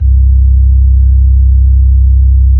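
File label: B-3, Hammond B-3 organ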